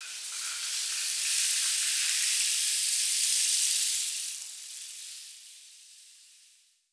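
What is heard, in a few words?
rain sticks; Spectrasonics; Heart of Africa 1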